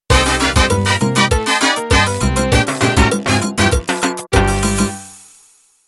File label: Mambo Intro